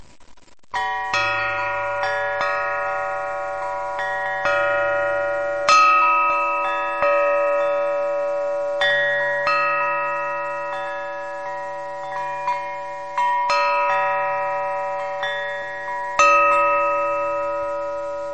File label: pine tree bell